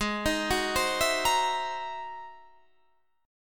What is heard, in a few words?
Ab 7th Flat 13th, chord, guitar; arpeggiated